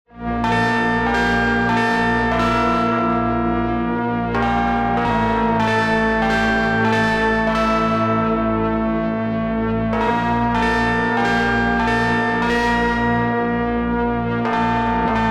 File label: Hardstyle super Slowed